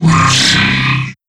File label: dry, strider, combat, movement grunt; Half Life Alyx